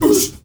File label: MALE WAR, SHOUT; Heart of Africa; Spectrasonics; Heart of Africa 1; CH. SHOUT2